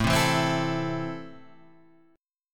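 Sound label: A Minor, chord strum; Am Chord {5 3 2 5 5 5} strummed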